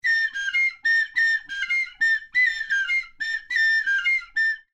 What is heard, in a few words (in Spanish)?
flauta gato, flauta de muelle